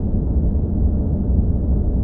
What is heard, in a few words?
SUB AMBIENT; Titanic Challenge of Discovery Panasonic WIN95